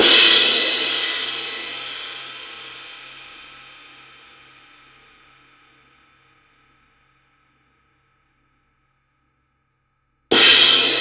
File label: X-Series Crash, cymbals